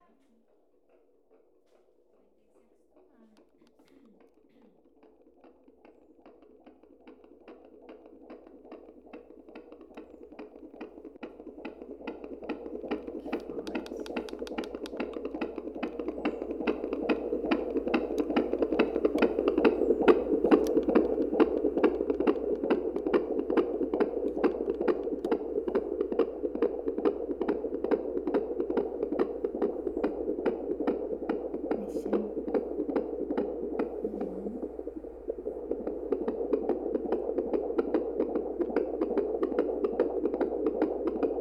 Babay Heart

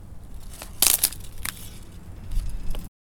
Snap

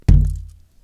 Flexible Wood Kick

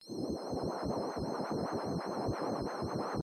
Light Beam

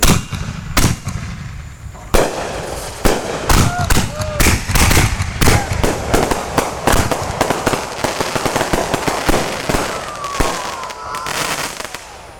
Fireworks 4th Of July First Ones

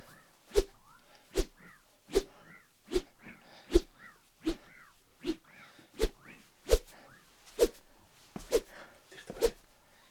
Fighting Whoosh

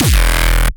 Hardcore Kick

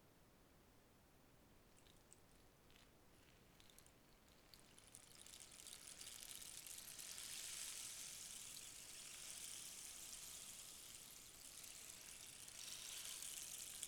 Rain Stick

